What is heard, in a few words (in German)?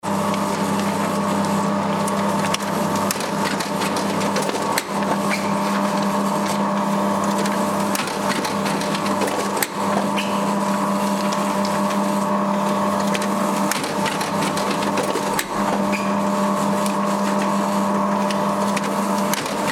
kuvertiermaschine